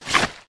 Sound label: sword